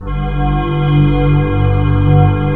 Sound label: FAIRWAVE G2, M1 FAIRLITE; Northstar - New Gold VOL-1